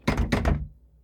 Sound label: akanai door